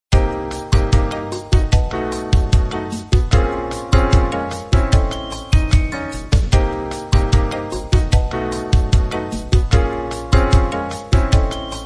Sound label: jazz quartet